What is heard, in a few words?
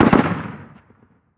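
gun 50cal distant; ModernWarfare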